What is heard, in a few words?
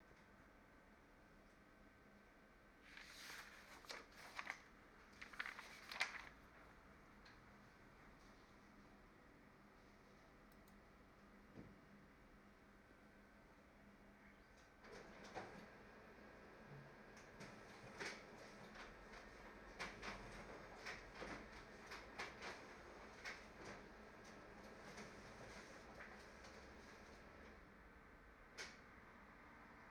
office, scenes; IEEE AASP